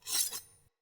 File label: Throwing Knives Un Equip